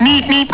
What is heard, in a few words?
meep meep